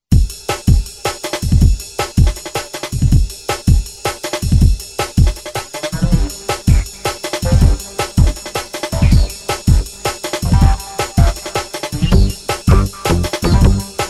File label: jungle